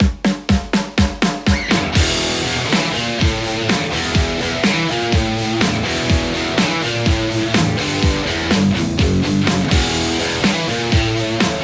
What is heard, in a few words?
strat; igniteamps emissary